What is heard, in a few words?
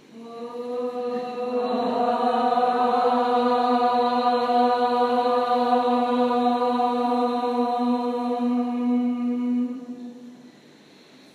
Opening Chant